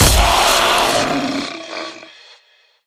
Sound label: big win start; jungle spirit